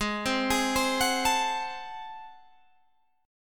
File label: Ab 7th Flat 9th Guitar Open G, chord